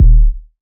BD, Waldorf Blofeld

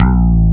BASS, Drum & Bass, 135 BPM; Ueberschall; Drum & Bass Resonance

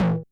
RM1X Drum Anlg Kit Tom Ana Dist; Yamaha RM1X

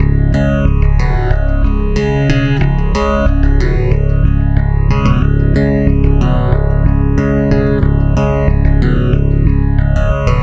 Stick Loop, Stick Bass

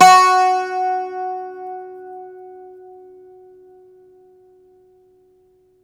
IRISH, Old World Instruments; E-MU Producer Series Vol. 7 – Old World Instruments; World Combos; Ireland

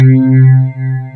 pluck C3, FM pluck

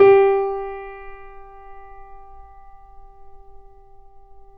Mp G 0 off, Grand Piano off mic; AKAI S6000 CD-ROM - Volume 2

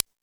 Timbale, E-MU Pro-Cussion

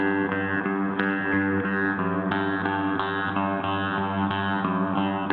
Gretsch Ring 90 G, guitar drone

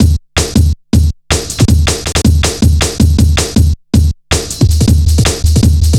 160, Drum Loops; Total Drum&Bass

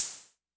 Hi Hats Open; LIMAT Div Dept

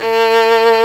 STR SOLO, String; Roland - String Master Series; Chamber Grp1

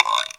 INST FX CRK, Ragga & Dub Reggae; USB Soundscan vol.27; AKAI

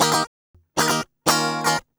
GUI 120 5bD#; Best Service Gigapack 2

